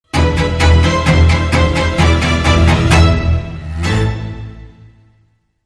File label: Sting